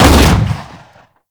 Fire Shot; Warframe; Weapons; Strun

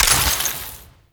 Ammo Pickup Corrosive; Ammo Ability; Warframe; Warframes; Frumentarius

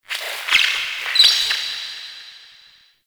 Critter Squeak Alt; Warframe; Ambience; Nokko Colony

